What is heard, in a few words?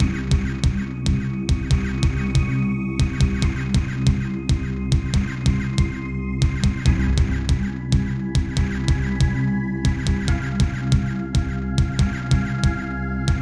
Casio CTK-800; sigil doom episode 5